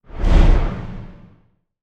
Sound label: Whoosh; Whooshes